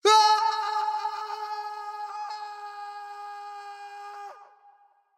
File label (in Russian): Падение; Фраза; Лусио